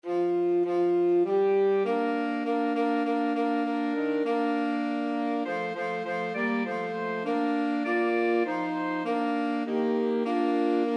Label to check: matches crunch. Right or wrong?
Wrong